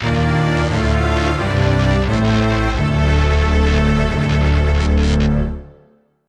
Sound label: Appearance Fanfare; Super Metroid